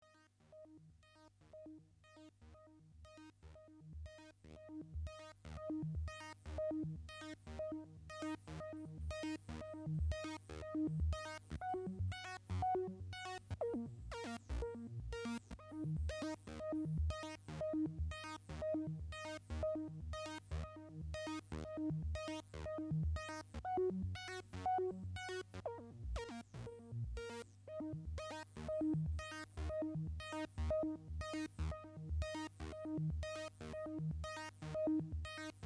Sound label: Mixed Synth Tunes